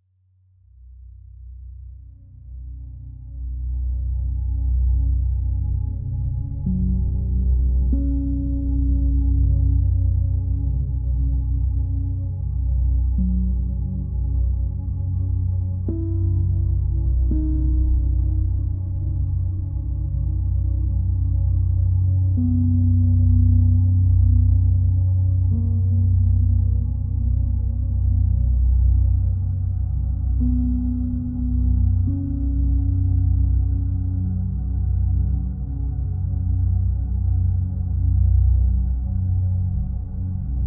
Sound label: pure ambience